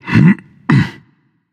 grunt lift; smite; tyr

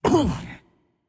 grunt hit; smite; jorm

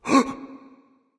surprise; smite; Vulcan

